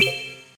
Upgrade Complete; Phasmophobia

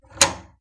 Door key lock; Phasmophobia

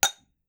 glass; Phasmophobia

